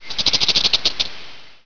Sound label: bug, ambient